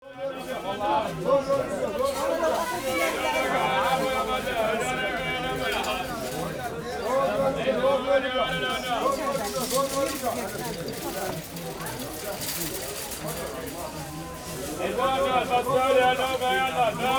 Market souk medium voices activity and vendor shout, Jordan; Charlie Atanasyan; Faunethic